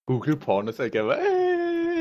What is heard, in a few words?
eeeehh